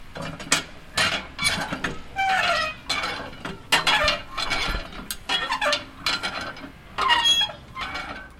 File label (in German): wasserpumpe